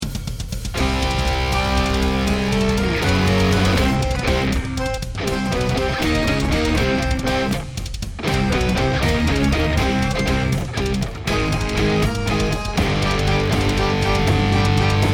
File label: start action, music